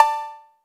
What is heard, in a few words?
Syn Cow; Roland R-70; Perc